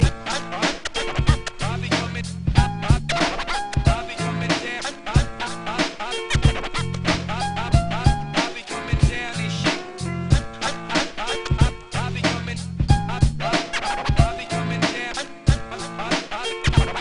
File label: rap beats